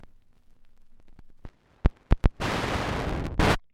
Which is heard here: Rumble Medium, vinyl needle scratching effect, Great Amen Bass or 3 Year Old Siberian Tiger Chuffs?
vinyl needle scratching effect